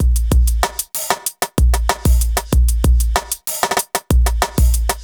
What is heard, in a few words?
kit